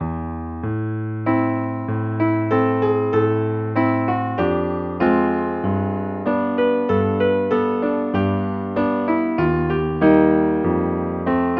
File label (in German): dissonant neunzehnstufig